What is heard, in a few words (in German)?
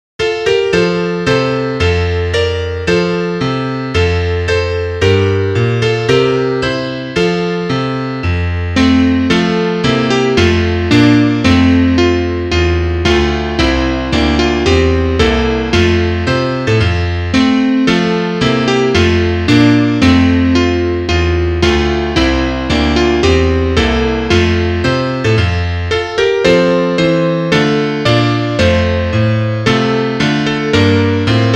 Klavier; Liederbuch; aus grauer staedte mauern